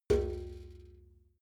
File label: metal bucket hit soft